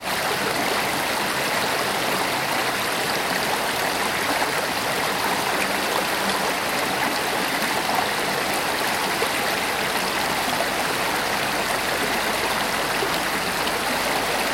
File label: Clare Glens soundscape